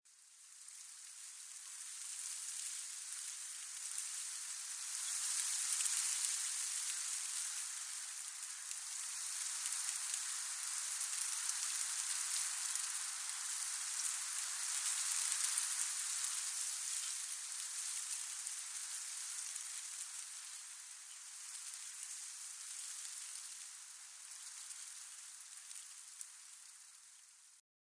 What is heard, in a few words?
Wind blows in leaves